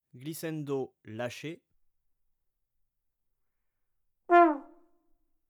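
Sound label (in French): glissendo lâché, trombone; Effets; Glissades; traite orchestration; trombone tenor trombone basse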